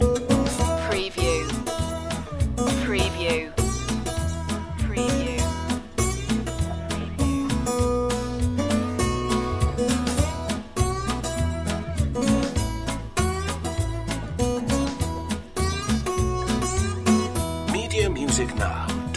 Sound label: countryslide loop